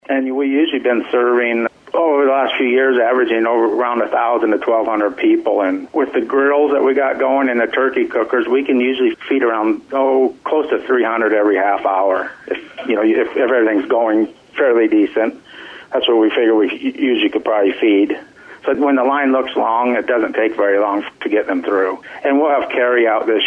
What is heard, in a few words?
Big Crowd; Wolverton